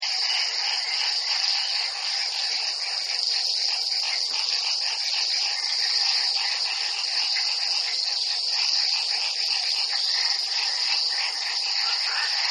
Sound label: frogs insects swamp; Nature Scenes; Animals